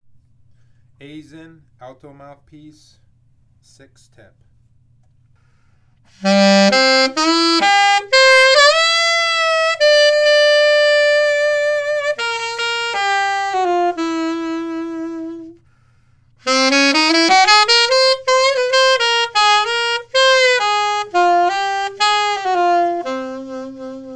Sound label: Alto; Aizen